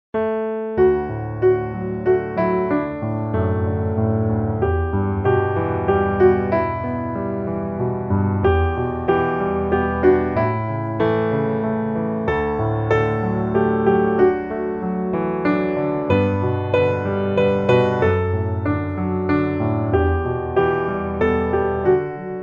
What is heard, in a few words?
piano